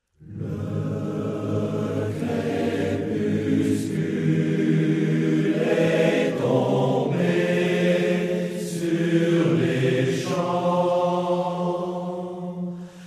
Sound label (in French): choeurs russes